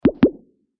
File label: Bubble Pop Double; bubbles